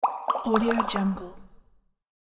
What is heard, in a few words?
bubbles popping